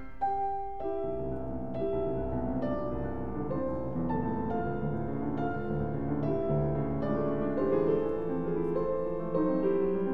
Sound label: Poco vivace; Beethoven; Diabelli Variations